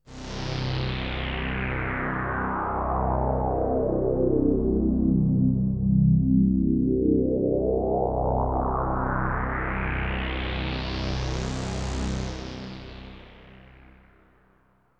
SYNTHPAD AMBNT 160 C; Ambient; Sphere

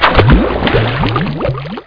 bubbles; RETURN FIRE

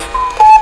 Cuckoo Bird; AudioClock 3.1.1

